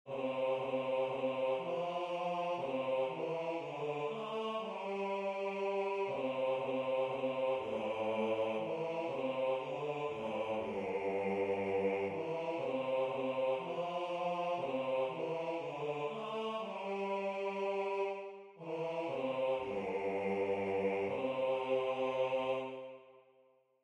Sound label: Bass